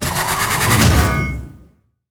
heroes warhead uppercut cast

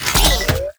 portable coil cast

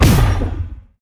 heroes spreading Burst impact; bonehart

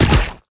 spiders hero global cocoons

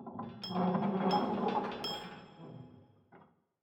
Ambient forge

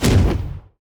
deathwood ranged attack impact; sfx

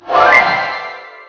Achievement Win

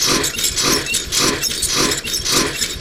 mecha walk

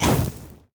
tower hatchery attack; sfx

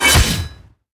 melee Attack; inApps helm Of Blackburn

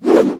Battle Axe

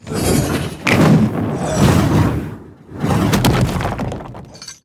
intro jump to path; bdk

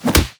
melee; wukong bdk

